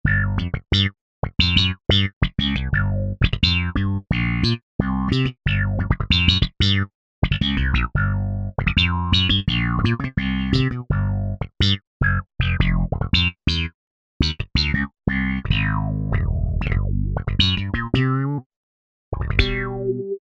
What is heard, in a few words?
Funkin Wah, Roland SRX-07; Roland SRX-07 Ultimate Keys